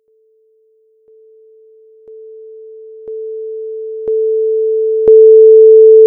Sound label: tones louder